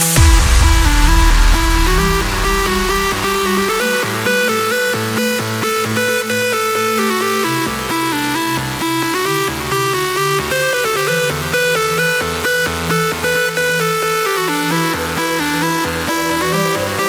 Trance

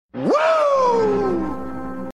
Wooooo

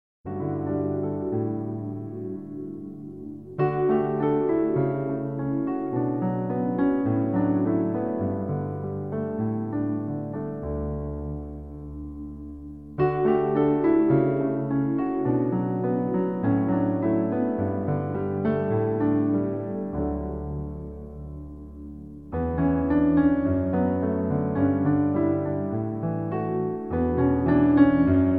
Silent Romantic Tone

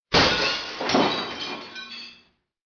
Broken glass

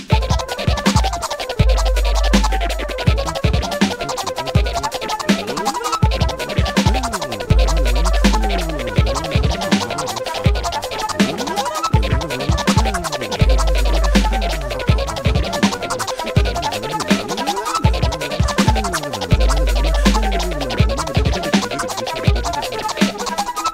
Scratches

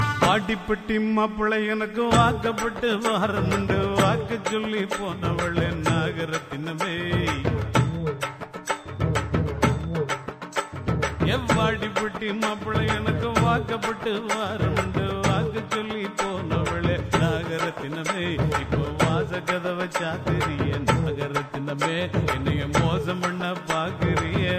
Tamil Gana

